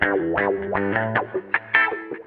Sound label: GUITAR